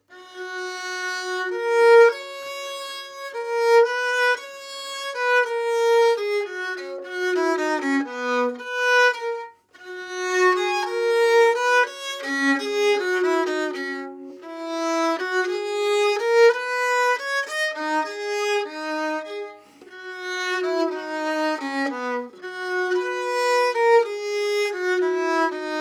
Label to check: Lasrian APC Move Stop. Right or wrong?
Wrong